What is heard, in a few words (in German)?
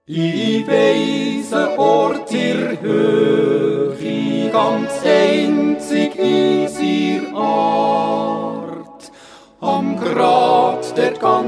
Terzett